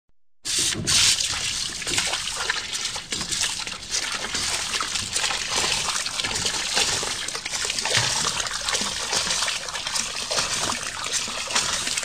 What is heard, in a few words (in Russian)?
plesk voln o bort